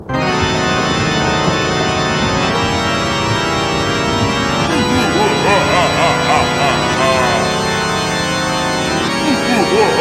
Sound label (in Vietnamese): nhac chuong Halloween kem tieng cuoi man ro